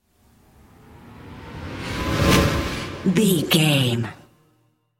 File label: boiling point rising swell button